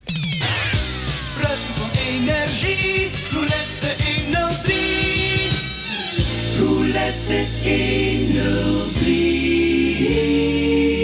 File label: silence